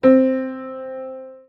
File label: piano c4